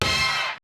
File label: perc, Roland MC-909